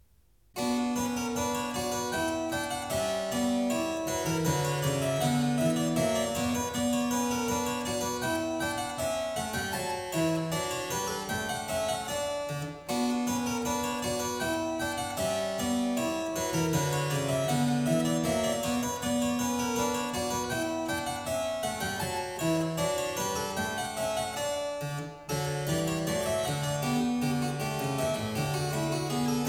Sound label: B minor, Anglaise; French Suite No.3; BWV 814; BACH; CLAVIER WORKS; FRENCH SUITES